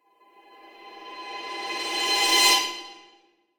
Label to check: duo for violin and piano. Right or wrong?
Wrong